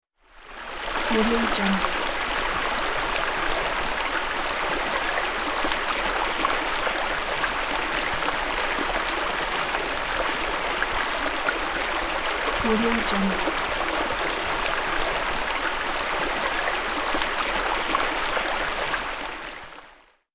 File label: river soft stream